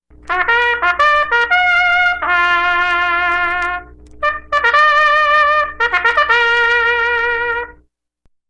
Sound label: Quarter Call